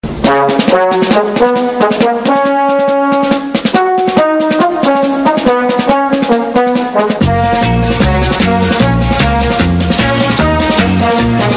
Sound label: ringtone